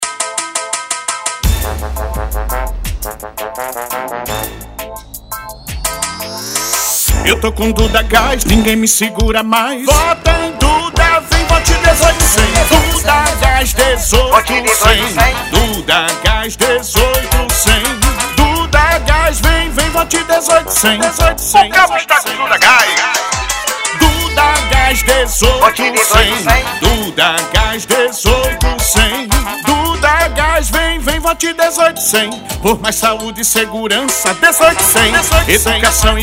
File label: JINGLE; DUDA GAS